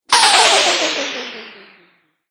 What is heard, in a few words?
Magical Air